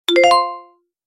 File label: correct